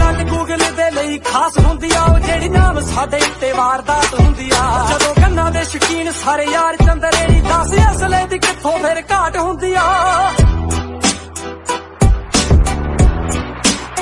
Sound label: Ringtone